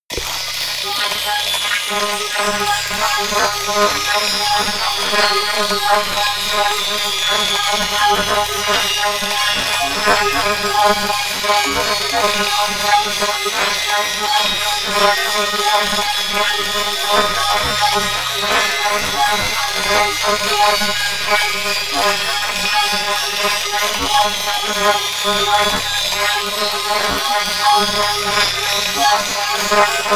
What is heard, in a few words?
atmosphere crazy didgeridoo